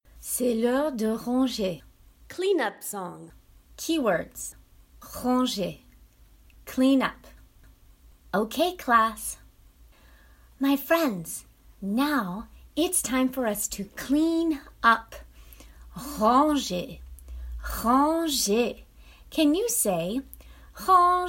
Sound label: Clean-up Song French; Rituals